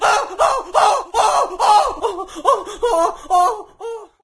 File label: wolf; cstrike